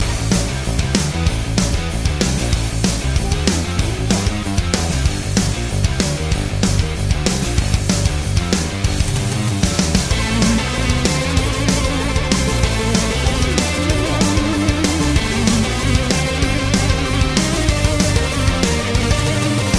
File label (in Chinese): 战斗背景音乐